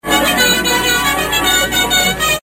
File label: horn; general lee